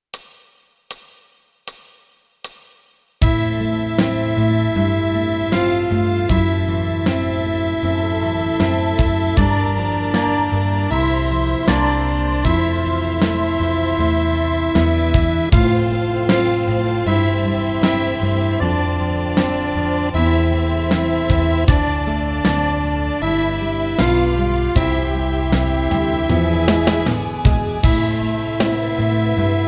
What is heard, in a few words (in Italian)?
Fisa tutti